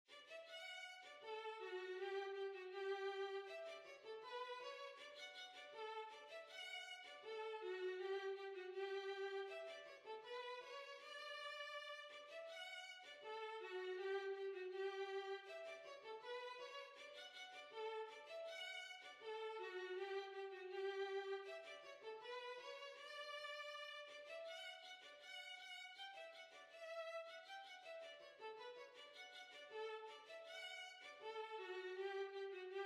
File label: Violin